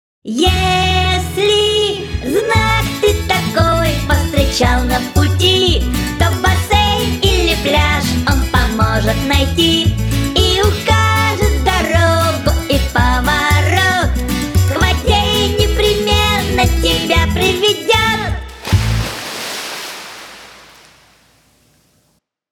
bassein ili plyazh; znaki servisa

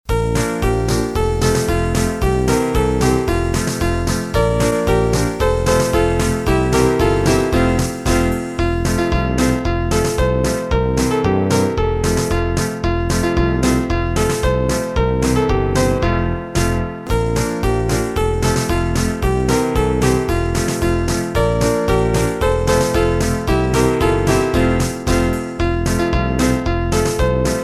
zaryadka muzyka dlya uprazhnenii

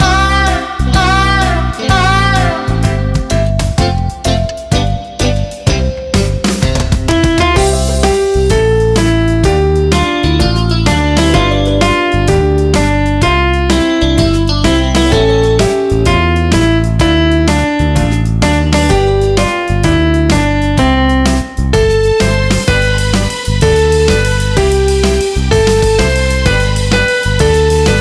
karaoke

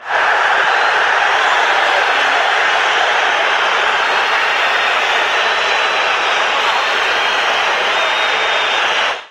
bolel shchiki; det-skaya-olimpiada

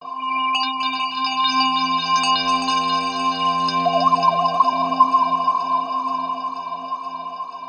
magicheskii zvukovoi effekt; zvuki novogo goda; audio effekty dlya prazdnika

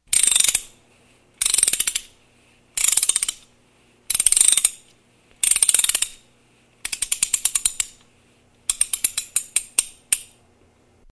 zvuk zavoda chasov; audio effekty dlya prazdnika

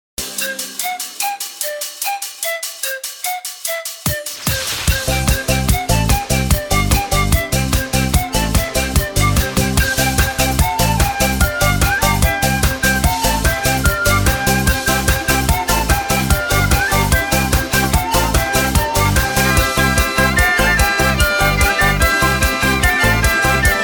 chastushki fonogramma; muzyka dlya chastushek

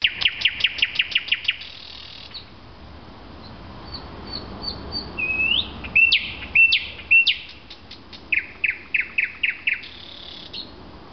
zvuki prirody peniye solov ya; vesennii sbornik

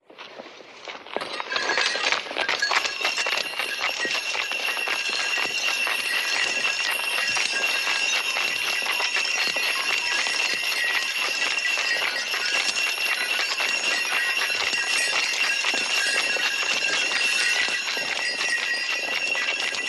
audio effekt troika loshadei s bubenchikami; prirodnyye zvuki zimy zimniye audioeffekty

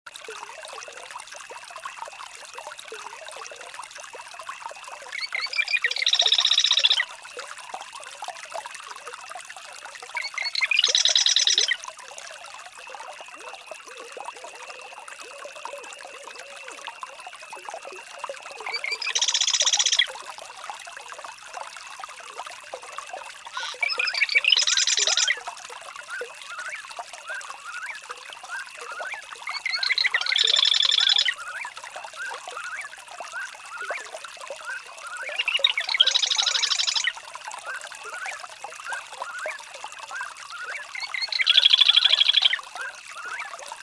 golosa ptits

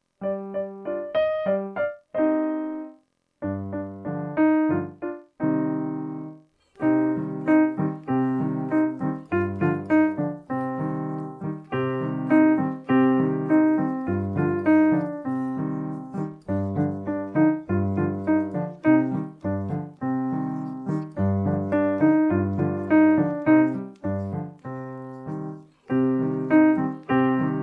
babushka kupim kurochku minus

